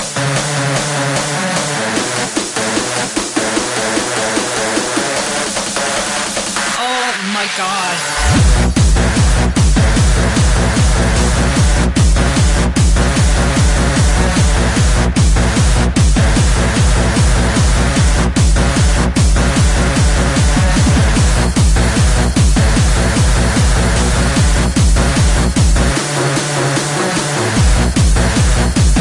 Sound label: Tune